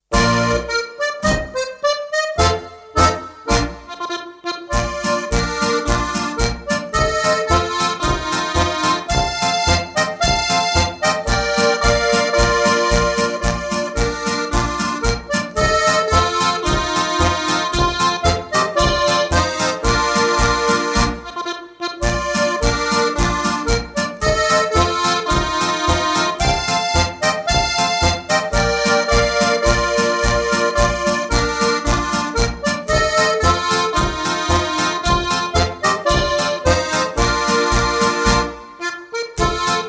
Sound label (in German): Harmonika; Jugend & Harmonika